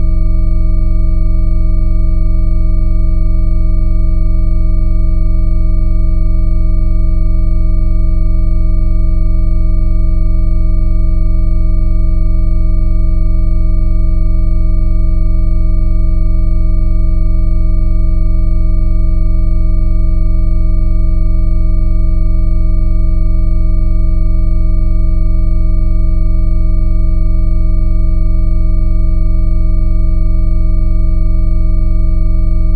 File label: nonharmonic